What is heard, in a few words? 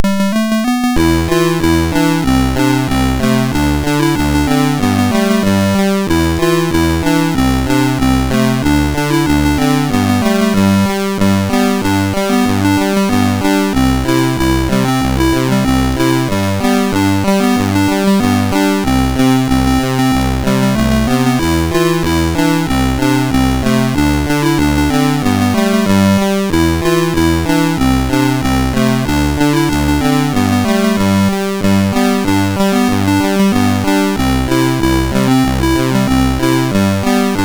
MOS6581R3, sid; Omidar